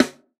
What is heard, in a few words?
snare samples, Rock